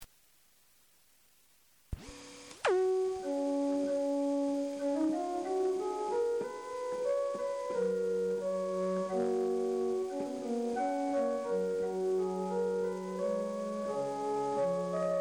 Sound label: fughette